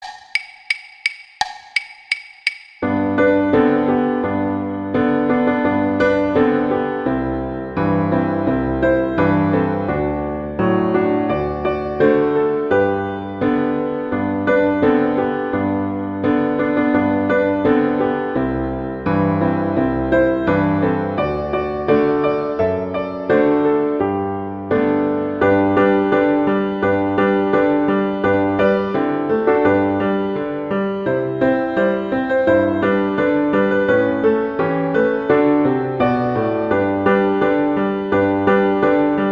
Jingle Bells acc; Christmas

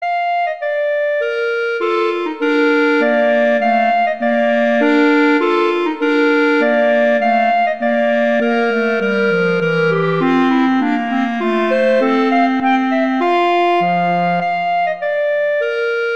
Duet; Mozart Duets

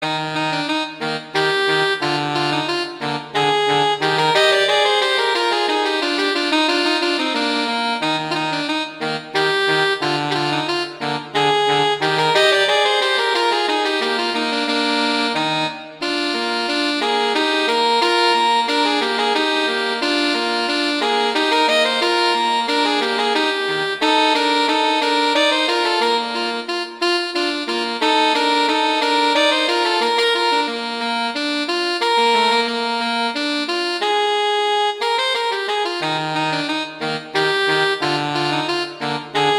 Duet; Mozart; Duets

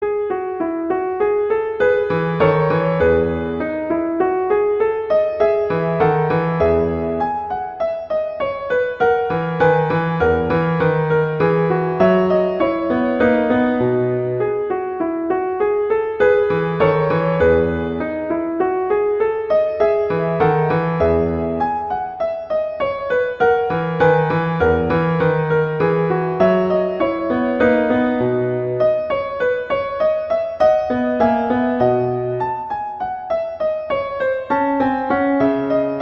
Menuet; Bach; French Suites; Suite VI

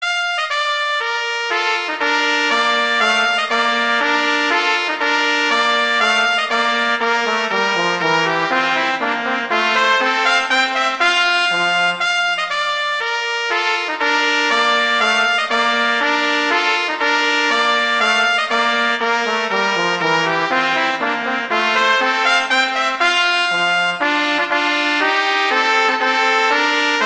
Duet; Mozart; Duets

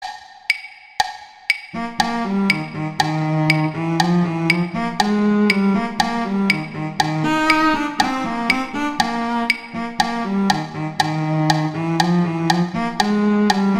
Cello II; Duet 12; Mozart; Duets

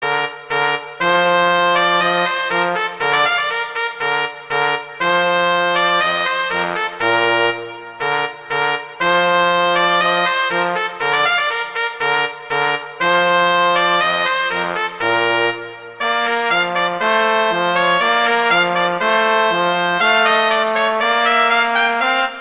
Duet; Mozart; Duets

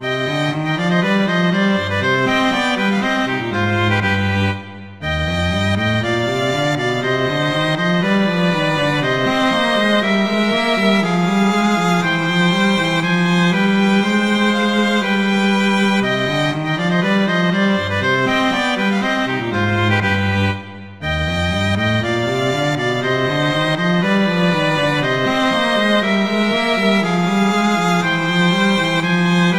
Allegro; Corelli

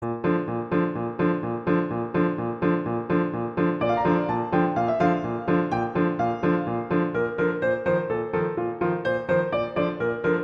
Chinese Dance; Tchaikovsky; Nutcracker

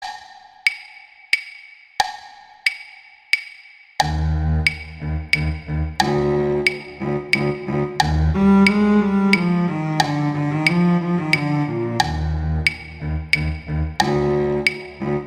Cello I; Duets; Mozart; Duet 11

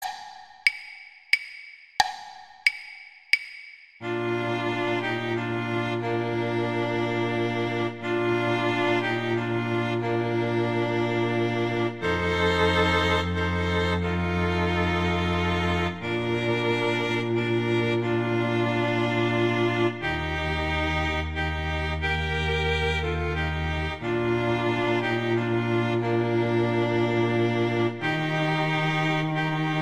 Viola, String; Gruber; Silent Night

